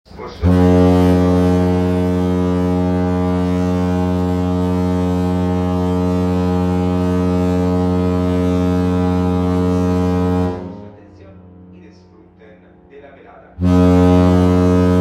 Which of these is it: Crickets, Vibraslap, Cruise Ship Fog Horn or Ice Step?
Cruise Ship Fog Horn